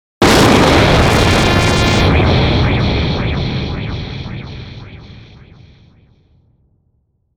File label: Impact Blip Dark Reverb; Low Impact; Production Element Imaging Element Accent Transition; Blastwave